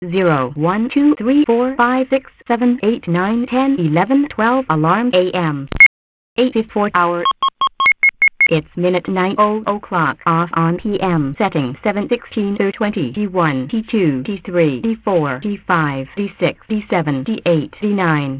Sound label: Cube Clock Samples